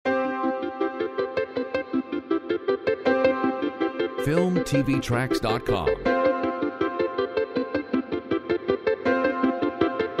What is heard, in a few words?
Gentle wind